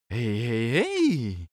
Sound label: male cheer mildly "hey"; koerpergeraeusche; menschen; soundpool